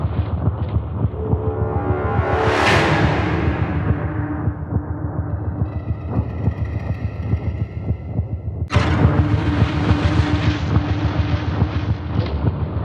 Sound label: Forest Night Loop Music; Warframe; Cinematics; The Sacrifice; Sacrifice Quest